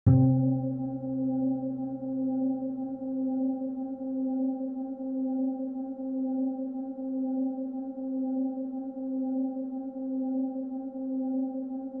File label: ambient